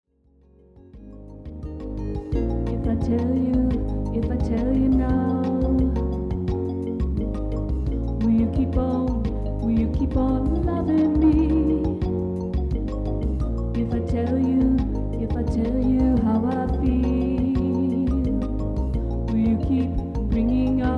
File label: Jazz